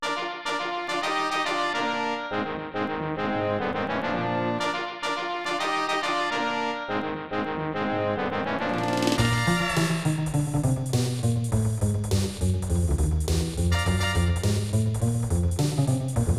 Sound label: Battle; thoroughbred breeder 3